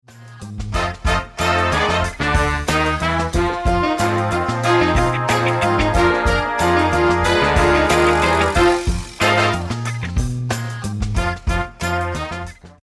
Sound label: brass